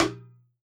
MIXED DRUMS; Best Service ProSamples vol.52 - World Instruments 2; AKAI

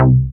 BAS, Eurotech; Sounds Good

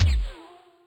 BD indst, Electric Kick, Yamaha DTXpress; drum machine

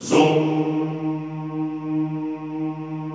ZOOMMM, Extended Classical Choir; Best Service

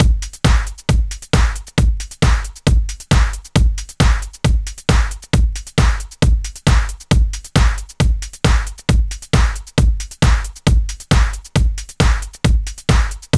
135 Drums Full, 135bpm; Trance; Construction Kits; Zero-G Trance Inducer; 90 s Sample CDs

